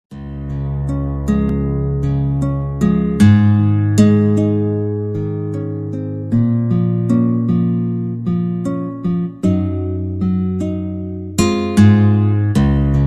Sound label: hawaiian guitar